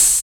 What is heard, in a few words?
HH, Dance Mix